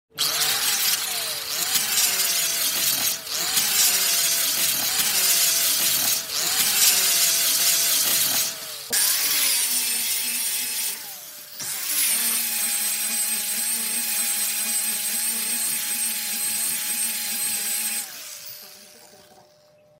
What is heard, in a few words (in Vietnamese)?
tieng cha giay giap giay nham bang may mon